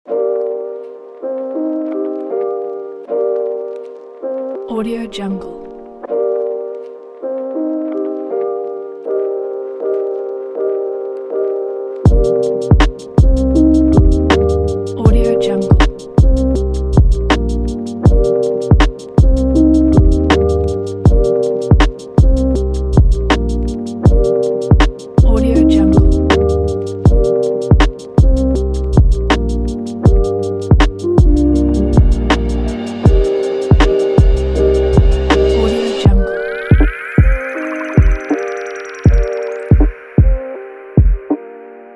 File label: lofi cozy beat; marblespace